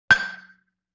Xylophone Clang G; Vintage Cartoon; Musical Elements

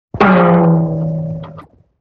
Can Hit; Vintage Cartoon; Drums & Percussion

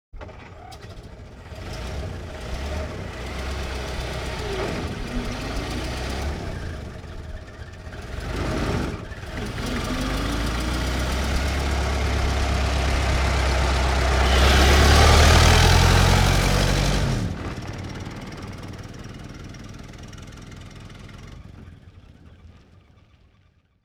1924 Ford Stake Bed Truck Ext Start Idle Away; Period Vehicles

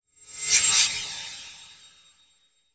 Flash Frame, Title Sequence Effects

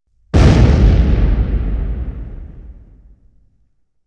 Explosion

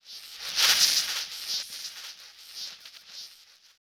Vermin On The Move, Horror; Sound Design

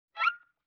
Violin Short Pitch Slide; Vintage Cartoon; Musical Elements

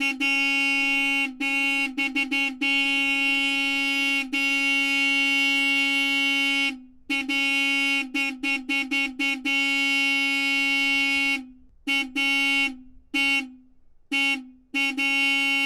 1929 Sedan Honks Various Lengths; Period Vehicles

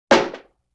Rim Shot Recoil; Vintage Cartoon; Drums & Percussion

